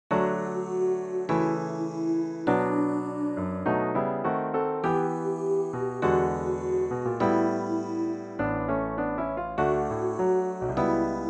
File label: thank you lord piano